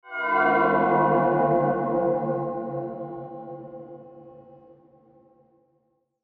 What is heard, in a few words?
Horror Swell Whoosh Eerie High; Scary Tones; Blastwave